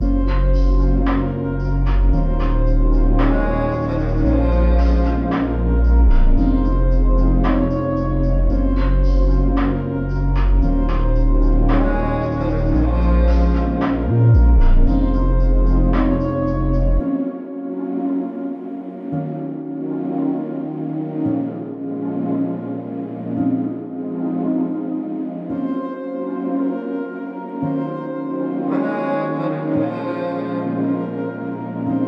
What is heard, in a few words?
Vague Melody; Cymatics